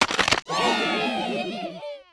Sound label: Bomb